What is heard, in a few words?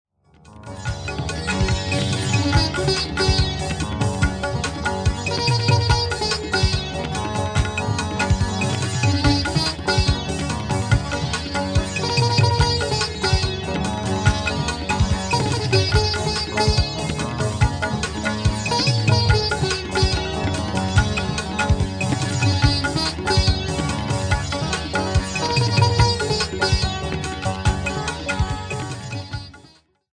india beat; fusion of rock and Indian music